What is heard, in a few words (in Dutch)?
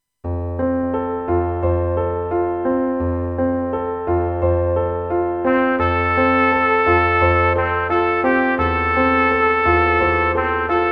opname trompet en piano; voordrachtstukken; diverse instrumenten